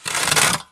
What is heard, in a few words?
Stalker Damaged Footscrape Long Concrete; Titanfall 2